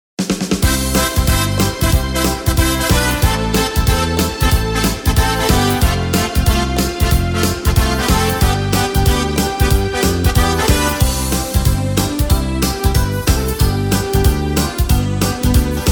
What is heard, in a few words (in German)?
Schlager Medley Spiel Melodie